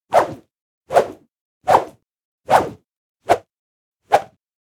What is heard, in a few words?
Swishes